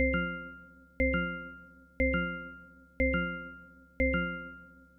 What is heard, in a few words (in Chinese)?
倒计时连续